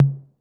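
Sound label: 808 mid tom; Crypton - DrumBase5000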